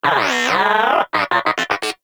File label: Moa Inquisitive Vocal Disagree; Pets; Warframe